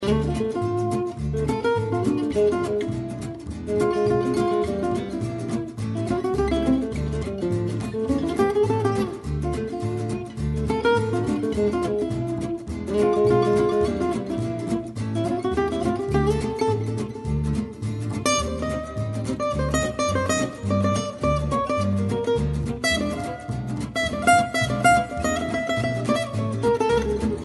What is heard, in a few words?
Bossa, Gypsy swing